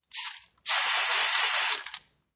Aerosol Spray, Spraying an aerosol can such as spray paint or bug spray; Objects